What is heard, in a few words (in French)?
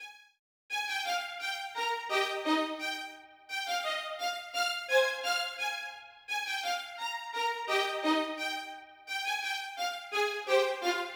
violons